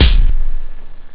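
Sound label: kick hi-hat; jazz jackrabbit 2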